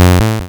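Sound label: waveform FM sawtooth triangle envelope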